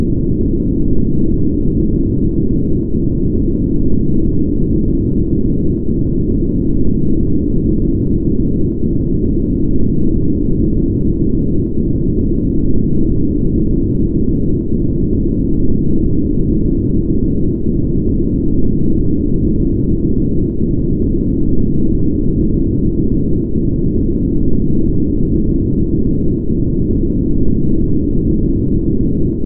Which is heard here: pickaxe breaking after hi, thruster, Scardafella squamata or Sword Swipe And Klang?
thruster